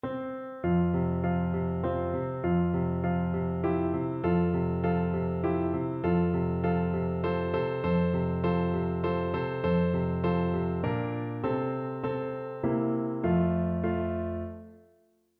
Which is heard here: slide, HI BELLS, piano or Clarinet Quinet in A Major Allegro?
piano